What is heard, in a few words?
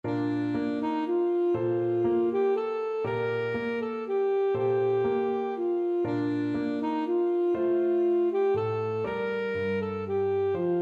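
El Noi de la Mare, alto saxophone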